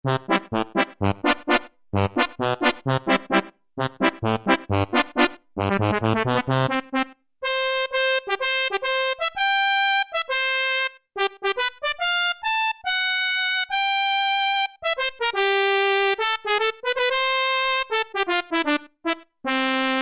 Brass; Cheetah MS6

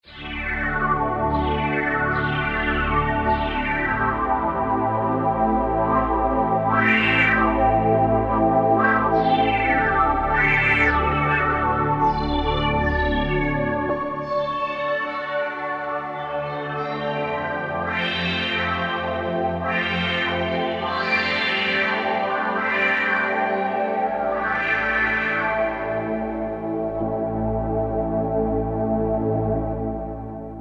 Kurzweil K2000 Hi Res Sweeper; Kurzweil K2000 V3; Factory Programs

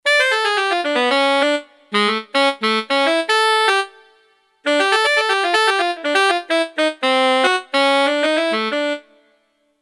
Fast Solo Tenor, Kurzweil K2500X; Factory Programs

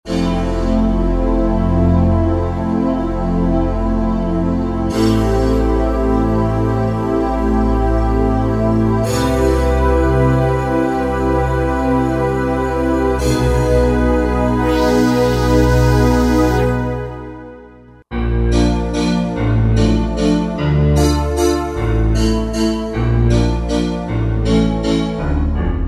Beauty Stack, Roland JD-990; Factory Patches

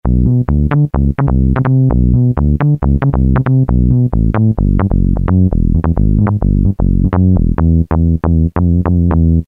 SH101 Bs, Roland M-DC1

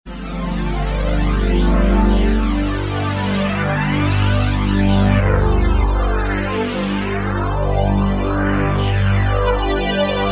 Supa sweeper, Roland V-Synth; Factory Patches

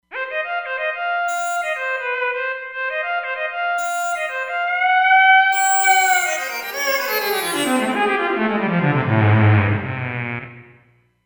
Mod Lead

